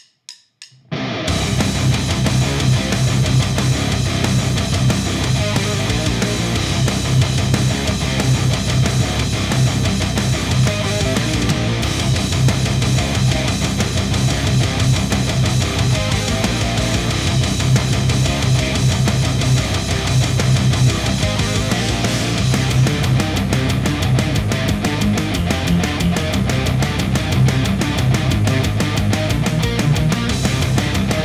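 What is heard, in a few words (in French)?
test back in, Mesa rectifier, ampli guitare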